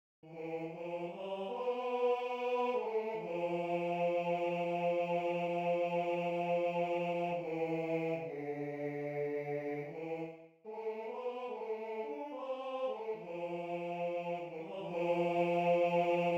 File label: bass